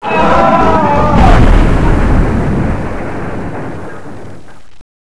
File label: jetdie; XMEN2